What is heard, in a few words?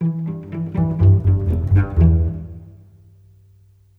Bass Cello Pizz, Cinematic; Sonic Foundry (Sony Creative Software); Orchestral Series VOL-3